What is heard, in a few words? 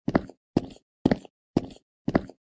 Stone Stairs